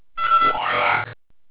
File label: blip; warlock